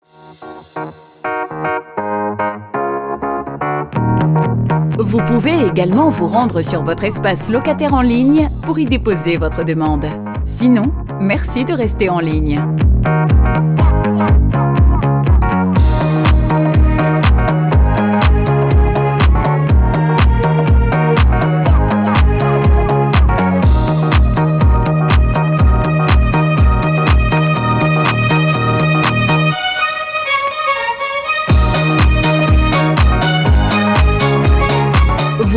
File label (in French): HARMONIE HABITAT ATTENTE